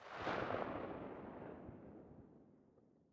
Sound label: silencer sv98 mono very far